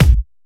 Bassdrum; Vengeance Samples; VEE Bassdrums

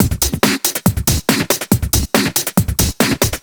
Breakbeat 140 BPM

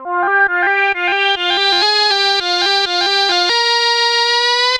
Arp C Line, ARP Odyssey; musicradar-synth-samples

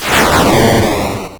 Arcade Riffs

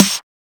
Snare; Ghosthack

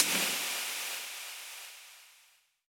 134BPM Noize Fx; Vengeance Samples; Lovely Day Kit; Sound FX

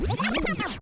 back spin med, vinyl record

